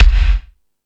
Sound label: Discoball, Drum Machines E-MU Pro-Cussion